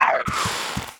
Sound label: Lizard war damage